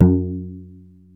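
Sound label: MUSICAL BOX; E-MU Formula 4000 Series Vol. 4; Earth Tones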